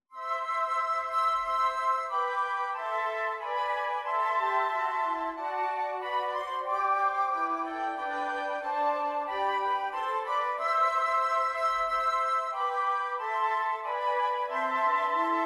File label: jazz FLUTE, swing for flute quartet; hark the herald angels